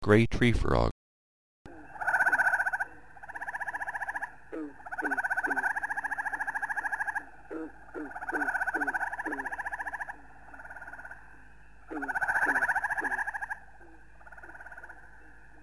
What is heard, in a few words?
grey tree frog